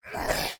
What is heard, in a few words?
Grumvel Cry